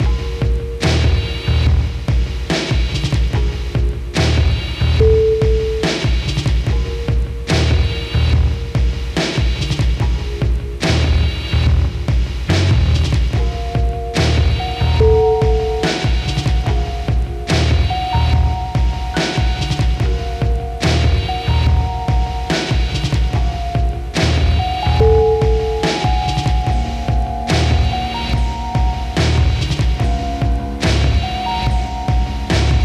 retro trip hop

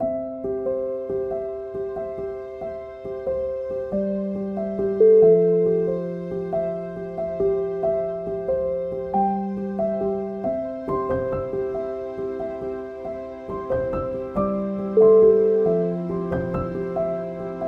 luminous piano

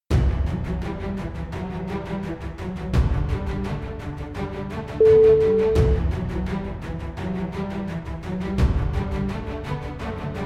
victory cinematic trailer

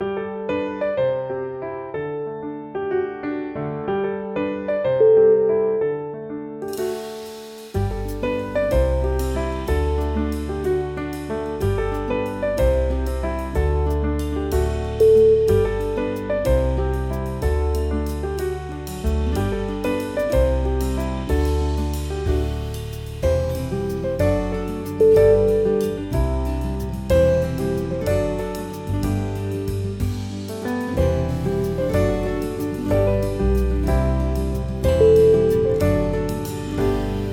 inspirational piano background

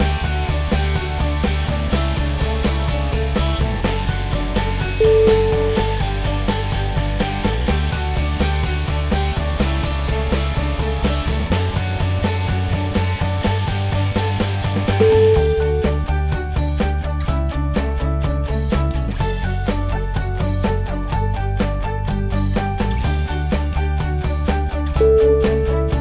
inspirational rock

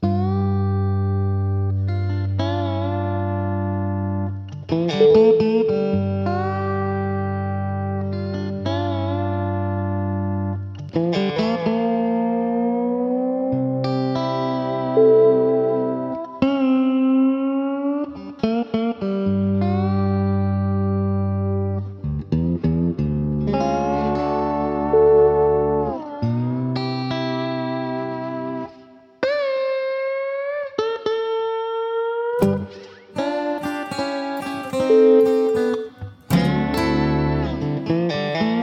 western lap steel guitar

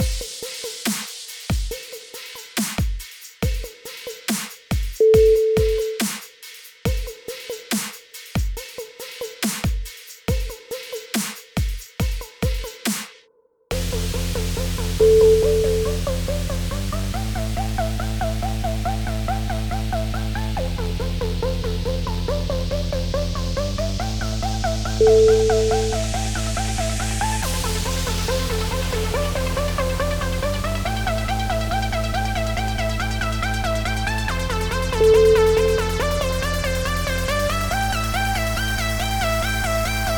dubstep

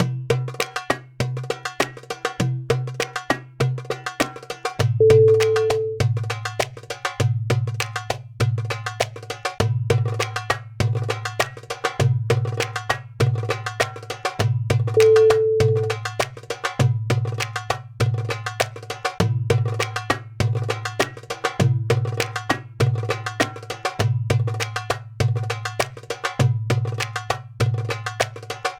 arabian rhythms

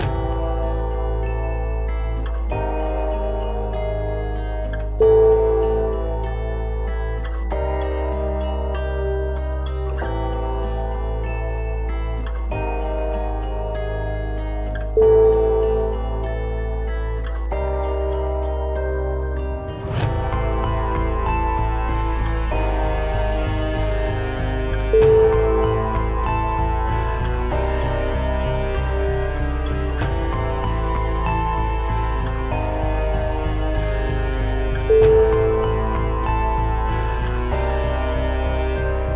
haunted house mistery

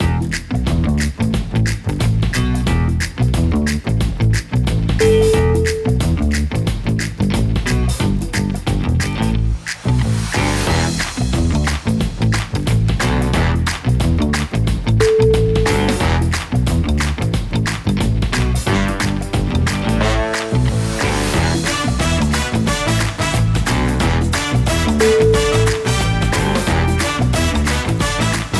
modern advertisement

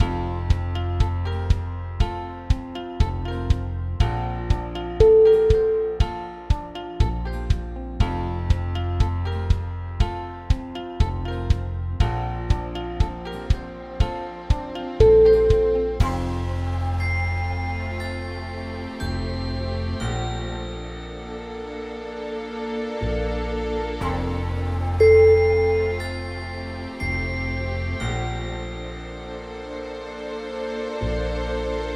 corporate chill

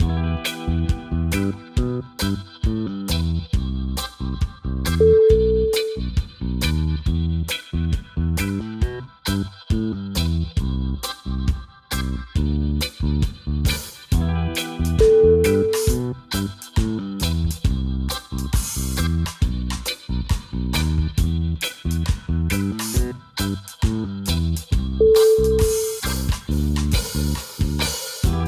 drunken reggae

